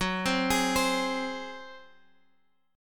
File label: F sharp Suspended 2nd Flat 5th, Guitar Drop D; chordshape; down